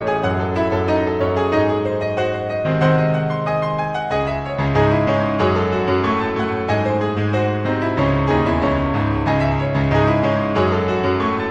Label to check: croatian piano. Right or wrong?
Right